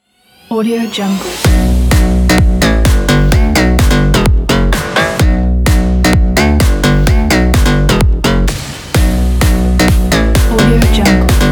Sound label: future house logo